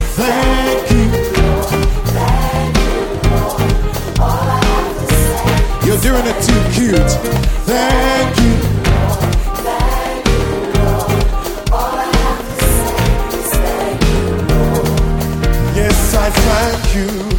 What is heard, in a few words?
African Medley